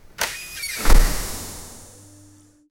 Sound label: space station airlock doo